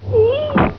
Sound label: splat